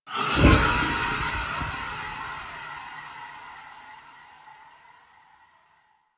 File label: Purgatory Ghost Spawn; Warframe